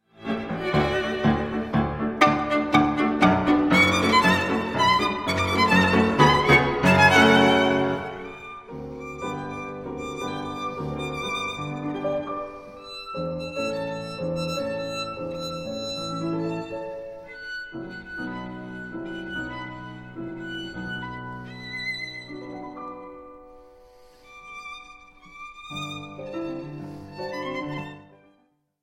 serenade espagnole violin piano; cecile chaminade; op 150

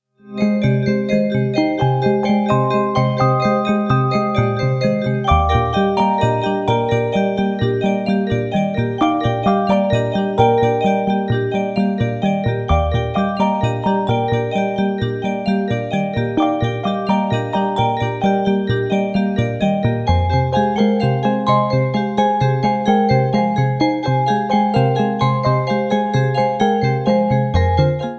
piano; chris fitkin; piano circus; sextet

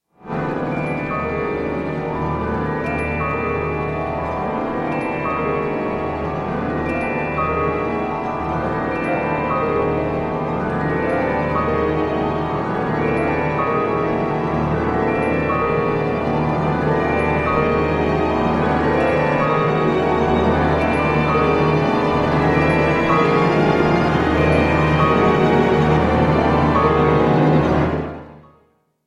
orchestre symphonique, music for strings percussi; bla bartk orchestre symphonique de montral charles dutoit; music for strings percussion and celes

